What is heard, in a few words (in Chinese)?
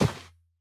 骆驼沙地脚步声